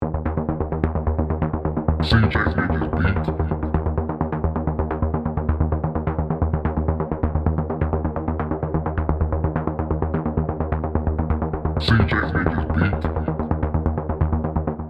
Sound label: EDM Get Up and Move